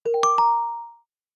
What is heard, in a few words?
quite impressed, notification sounds